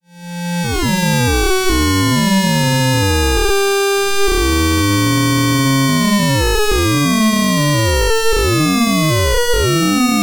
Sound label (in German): Nano Modules FM Fahrt